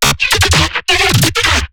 glitch stab; Virtual Riot; Glitch Stabs

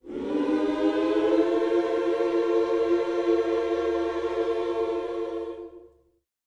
MM MINOR, Gospel

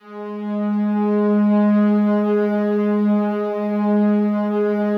SLOWSTRINGS, G#2; Yamaha VP-1; 90 s Sample CDs; Music Store

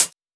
Closed HH; Vengeance; Essential Clubsounds Vol.4; Cymbals; Closed Hihats

